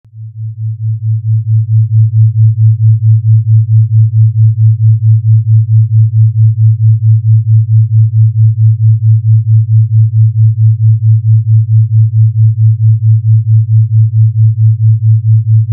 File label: Theta Binaural